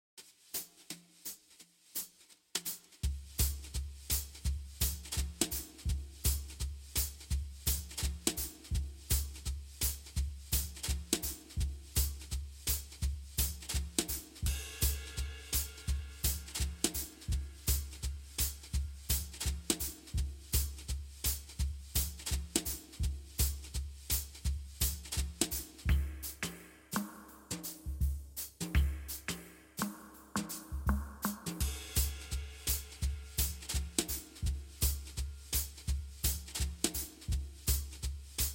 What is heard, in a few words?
drums stems